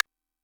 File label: rimshot; MFB-522